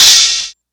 Hi-hat, Hip-Hop

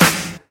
Snare; VEC1

